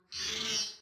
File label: insects deep mud; factorio